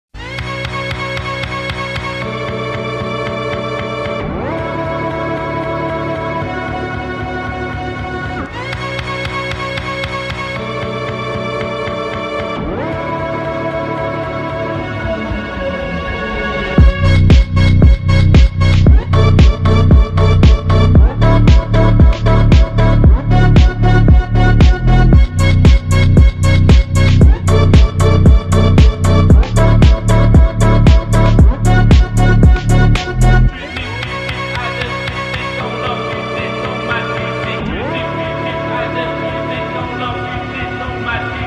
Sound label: phonk